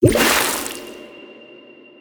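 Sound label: fish heal, impact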